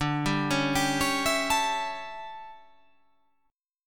D Major 7th Suspended 2nd Suspended 4th D

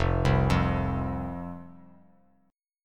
F 5th F, down, chord; F5 arpeggiated

